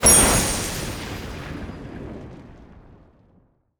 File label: crystal; netent